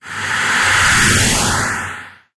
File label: synth sweep